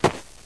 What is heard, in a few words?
softshoe generic, footsteps